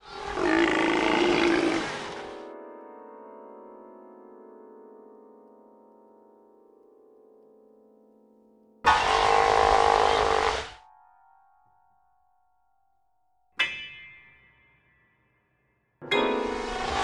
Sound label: piano